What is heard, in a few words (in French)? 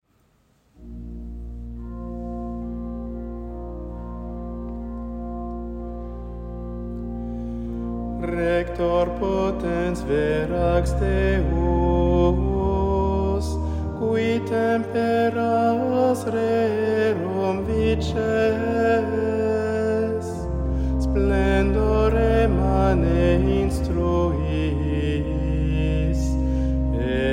sexte memoires impair ordinaire latin; heure mediane impaire ordinaire